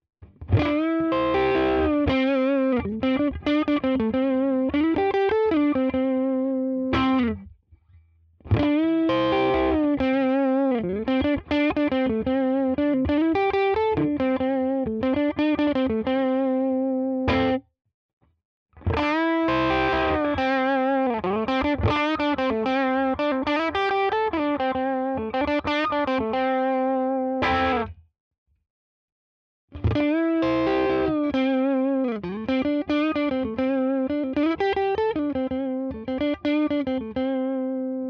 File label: crunch Licks all positions; Schecter Vintage PT Fastback II B